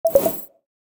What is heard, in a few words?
Futuristic transition